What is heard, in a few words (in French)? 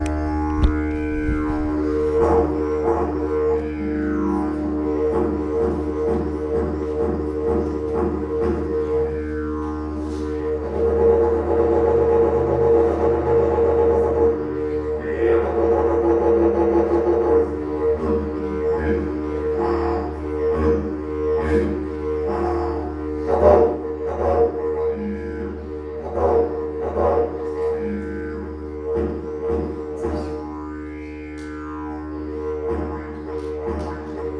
didjeridoo instrument; Australie